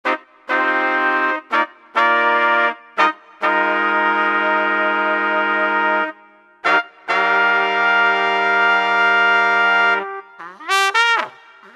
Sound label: Brass Trumpet; amg; AMG Kick Ass Brass Trumpet Demo